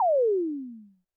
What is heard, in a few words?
Roland TD-20 Percussion